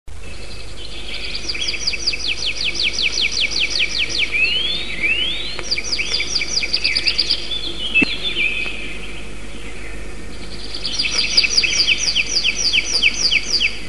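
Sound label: tree pipit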